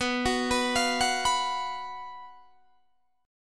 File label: Gb Major 7th Suspended 4th B, guitar